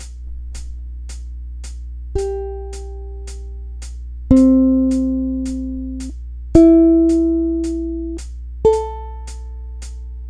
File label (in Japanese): チューニング